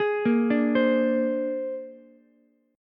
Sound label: Bb 9th, Ukulele; 13,10,10,15; arpeggiated